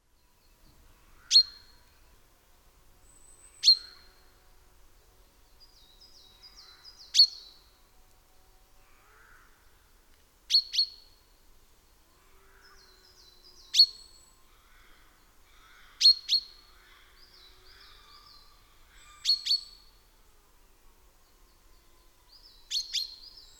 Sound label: Fringilla coelebs; SETE